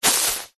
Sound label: stand jump or sit on bale of hay; foley